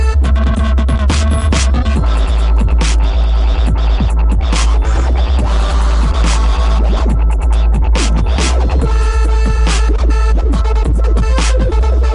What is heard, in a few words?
Dubstep